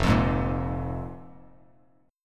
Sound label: F Minor Double Flat 5th, F, Bass Standard; Fmbb5 strummed; JGuitar